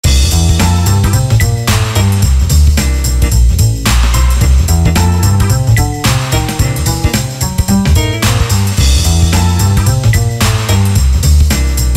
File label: Race Lose